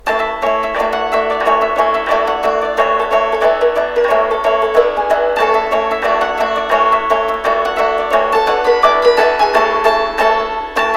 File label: Fischer's Hornpipe